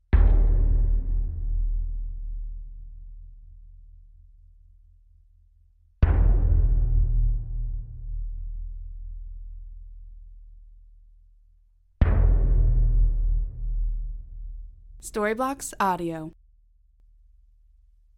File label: cinematic fat hits